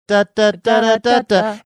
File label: singing few notes